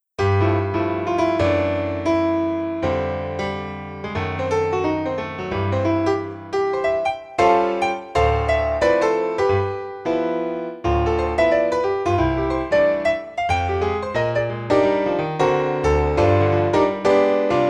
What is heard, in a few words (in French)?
Piano seul